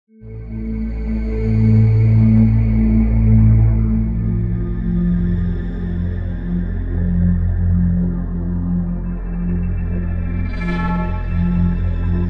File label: Horror Ambience